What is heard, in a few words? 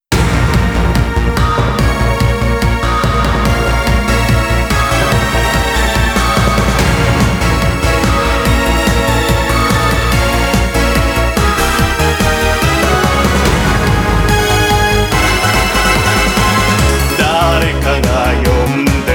Theme Song Super Robot ver.; Zettai Hero Project